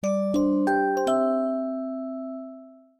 music box, notifications; ZTE nubia Z20

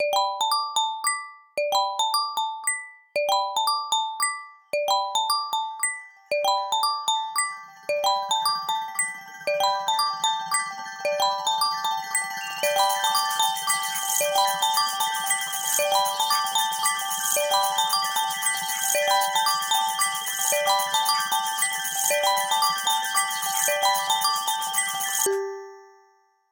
glass bubbles, ringtones; Google; Google Sounds; Play It Loud

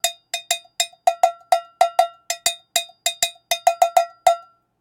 More Cowbell; Google; Pixel-4; Reality Bytes

